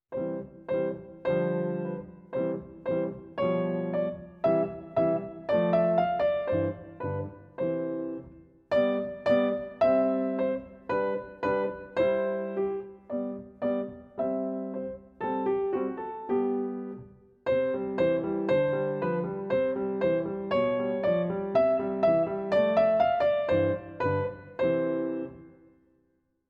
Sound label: Etude in C Major; op 125, no 3; Anton Diabelli; Celebrate Theory recordings